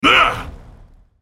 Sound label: spell cast effort; ZombieBrand